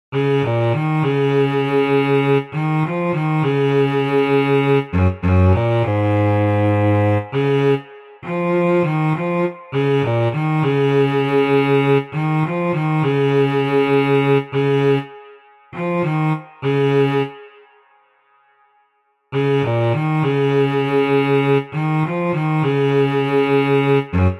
arrival deep string, Exotic Sci-fi adventure television music